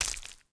hanging Lizards Bump; impacts explos

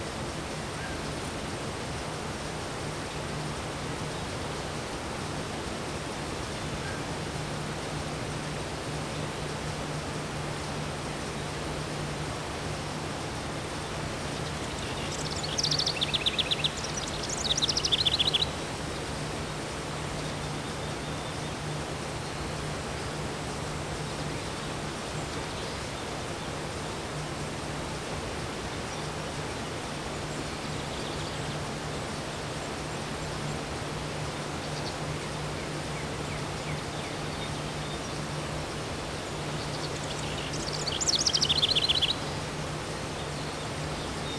courtyard ambience; Sid Meiers Pirates; XBOX

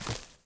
med creature fs dirt, footsteps

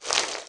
Mutant Slither; Valleakan Mutant 1; Aliens

